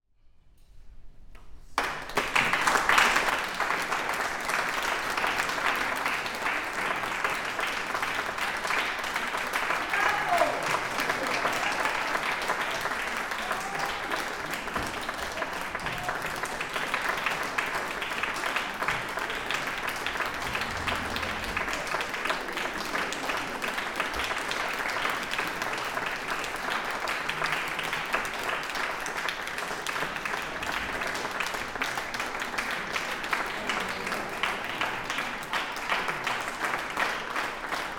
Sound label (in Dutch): slot applaus